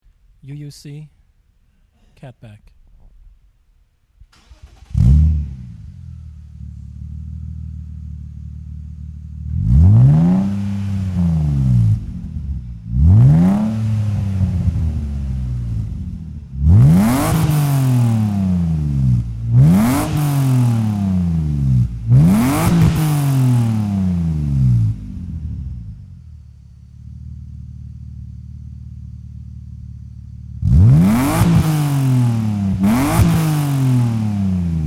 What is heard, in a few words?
Single Canister; EuropeanCarWeb; UUC System